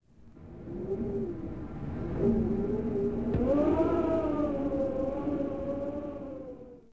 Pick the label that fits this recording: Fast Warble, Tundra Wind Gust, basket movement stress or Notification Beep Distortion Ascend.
Tundra Wind Gust